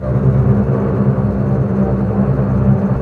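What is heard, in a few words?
double bass tremolo; Roland L-CD702; Cbs FX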